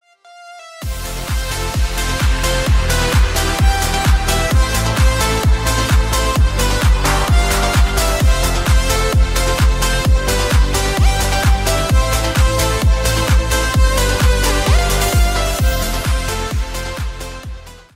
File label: Instrumental; sonnerie du salon